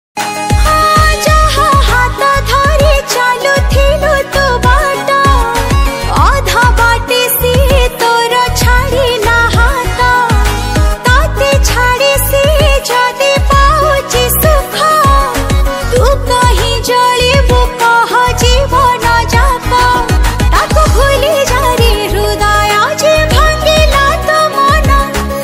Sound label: female ringtone